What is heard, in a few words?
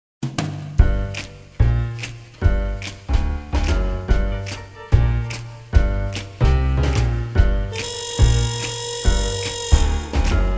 jazz